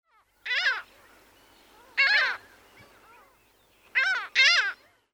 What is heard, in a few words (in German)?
Schwarzkopfmoewe Larus melanocephalus, Vogelstimmen